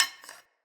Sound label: Hunter Bow Reload Arrow Knock; Weapons; Enemy; Bows